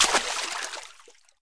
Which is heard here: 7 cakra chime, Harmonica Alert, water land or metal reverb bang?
water land